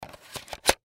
Cd Scratch Remove, Cd Scratch Remover Remove Bottle; Cd Scratch Remover Replace Bottle; Cleaning Sound Effects; Blastwave; Foley